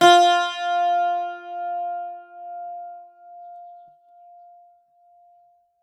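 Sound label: bouzouki F4; SoundBytes; Early Patches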